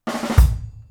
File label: KICK SNARE, Live Snares; PlatinumPhattCD1; E-MU Producer Series Vol. 8 – Platinum Phatt